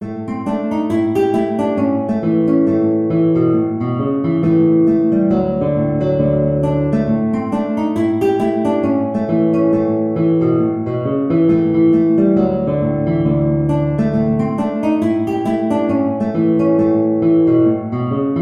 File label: guitar